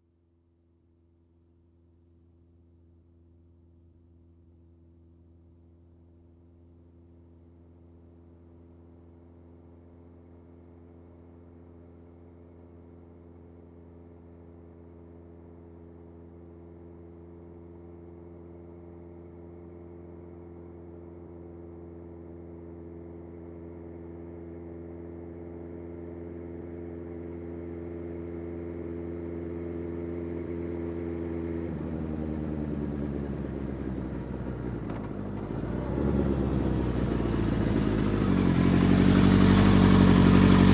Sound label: Motorcycle approaching slowly and parking